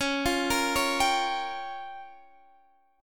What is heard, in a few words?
Db 6th Flat 5th Db down Guitar DADGAD